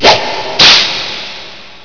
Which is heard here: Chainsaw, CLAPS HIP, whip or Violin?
whip